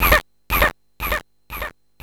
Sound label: SFX, Drum & Bass, 174 BPM; Ueberschall; Drum & Bass Flash Trackz